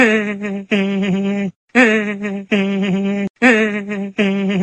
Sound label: hehehehehehe; peter griffin